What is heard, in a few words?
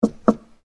Door Knock